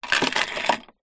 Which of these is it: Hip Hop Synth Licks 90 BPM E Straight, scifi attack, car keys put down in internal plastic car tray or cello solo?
car keys put down in internal plastic car tray